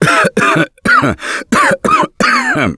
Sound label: Cough; Gambler; russian